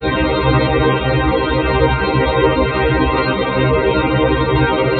Rumore armonico

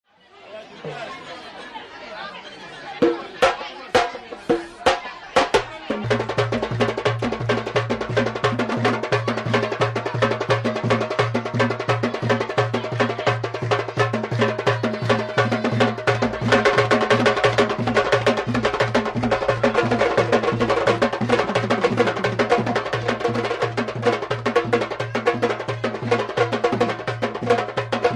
Musica popolare africana

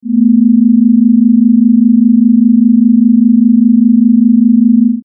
Battimenti 220 245 Hz

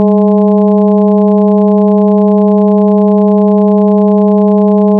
Ottava allargata

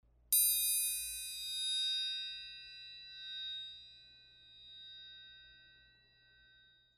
Triangolo Gira

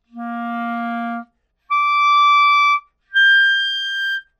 CL Armonici

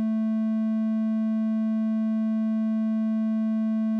Triangolo 220 Hz

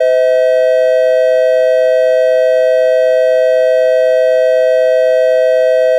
Terzo suono 512

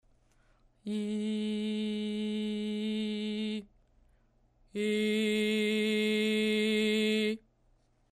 Testa Petto; VOX

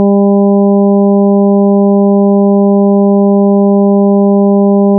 Ottava normale

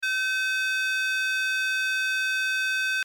512 passaalto 1000

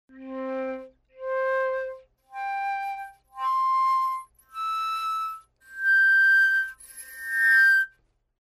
FL Armonici

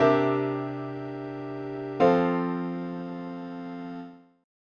Nona dominante

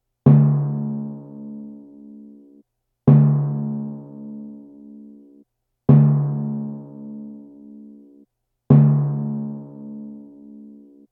Timpano Suono base

